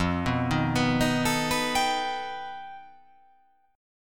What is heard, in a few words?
F 9th Flat 5th F down